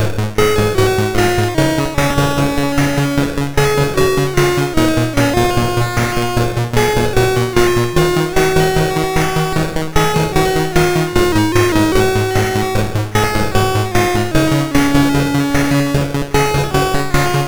Synth Sample III, MOS6581R2

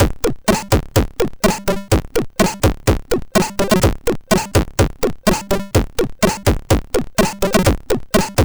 sid MOS6581R4; Feiweier Goesta; JOSH

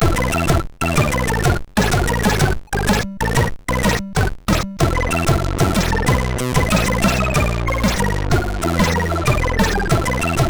sid MOS6581R3

